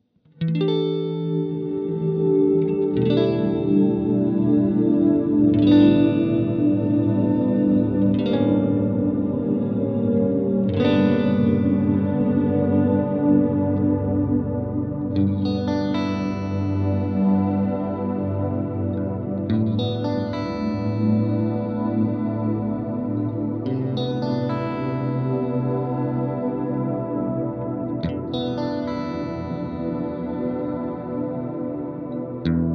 WALRUS LORE Chords

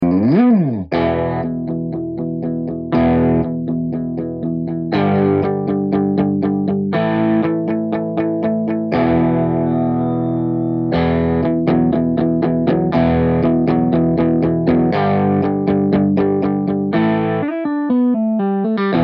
335 crunchy

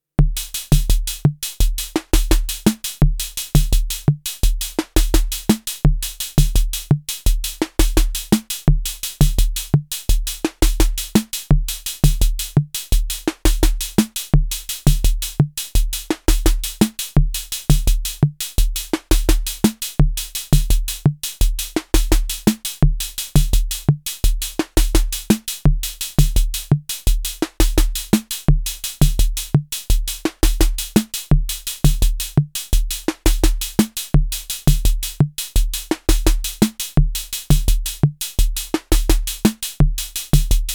MFB 301 Pro

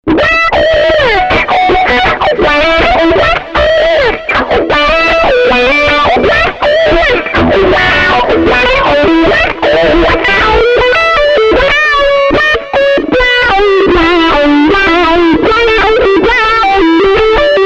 Burning Wah

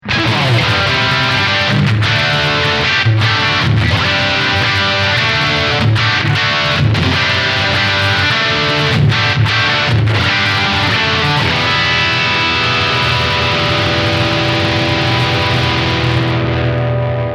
High Gain Low Focus Low

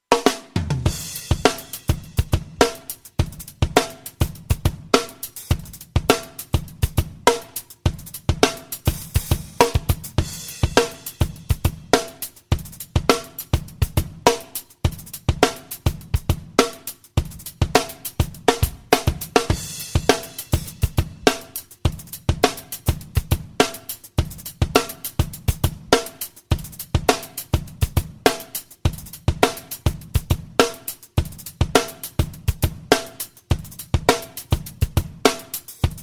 Natural Drums Medium; THD

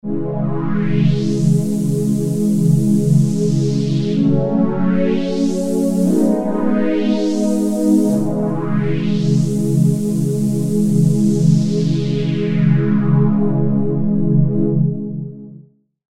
Layer 2 Pads

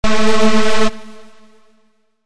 lead pan fx

